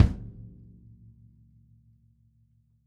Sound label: kick